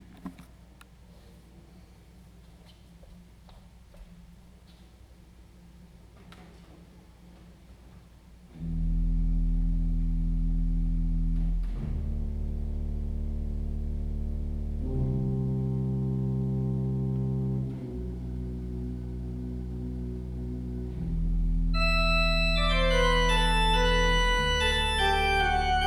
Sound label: pipe organ